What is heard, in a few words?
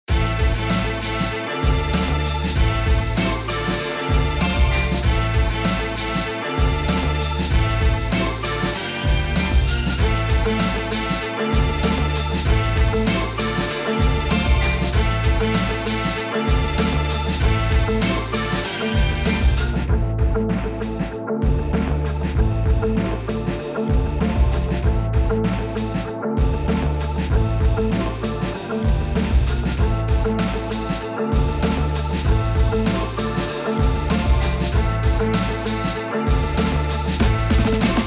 Instrumental, Hip-Hop & Rap